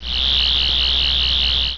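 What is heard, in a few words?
sonic screwdriver; dwho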